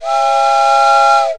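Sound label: steam whistle; citylife; business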